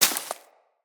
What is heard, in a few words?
Bullet Impact Debris Grass; Weapons